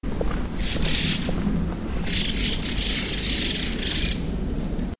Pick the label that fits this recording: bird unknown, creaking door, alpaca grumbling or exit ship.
bird unknown